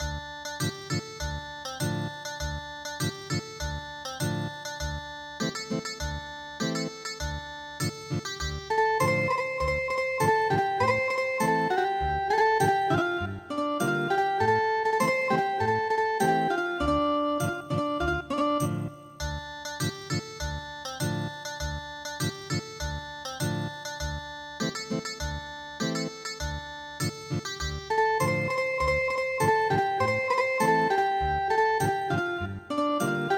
chonko bushi Ethnic Guitar

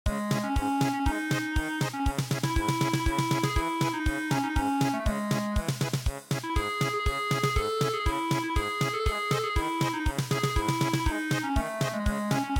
German Polka

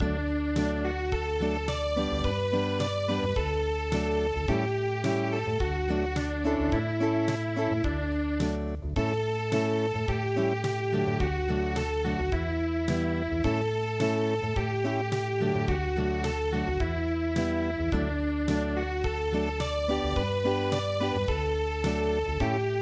Unplugged

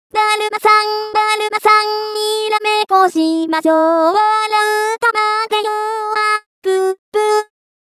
vocal only; traditional

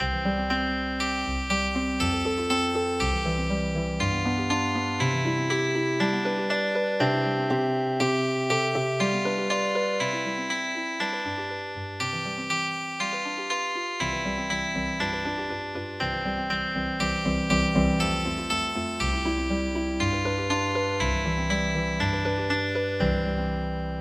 Twinkle Twinkle Little Star Healing Harp

